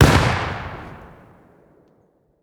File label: f1 grenade explosion; Rust